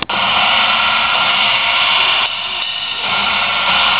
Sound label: serpant cymbal, percussion